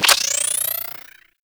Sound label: Charge Gun Charging; Warframe; Corpus